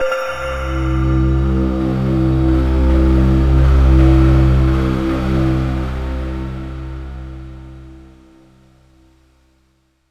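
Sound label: Yamaha SY22 Rain Night C1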